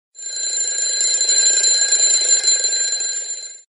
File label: htc alarms classic; ringtones